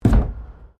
PLASTIC BARRICADE HIT; Material components for constructing more complex sounds

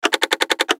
Button Switch Gear Multimedia

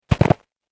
Snow Foot Step; human; Footstep Sound Effects

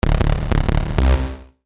Logo Static Soft Delay Bass; Sonic Logo; Blastwave

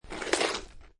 Snow And Ice Ice Skate Slide; Weather

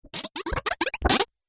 Little Bits Of Laser, laser sound effect; Sci-Fi Laser Sound Effect

